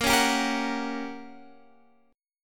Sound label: G Minor Major 7th Flat 5th, A sharp